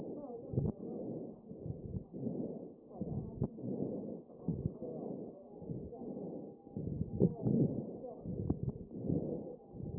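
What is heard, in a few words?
anterior chest, position 0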